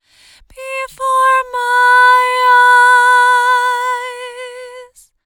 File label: Short Harmony Vocal - Fm 60Bpm; Construction Kits; VOCALS; Ghosthack; SkyRealm; Kit 04 - High Rock View